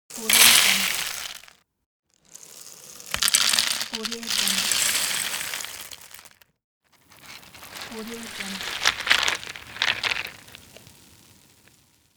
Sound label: pour cereal into bowl